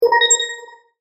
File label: Multimedia Chime, Technology, Multimedia, Chime; Chimes; BlastWave